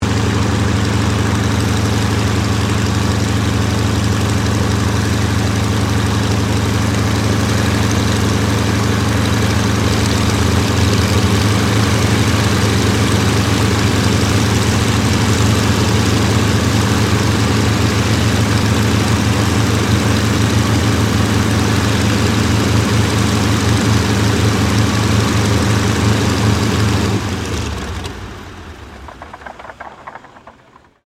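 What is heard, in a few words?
AIRCRAFT YAKOVLEV YAK-52 IDLE SHUTDOWN, Russian Training aircraft; Airplanes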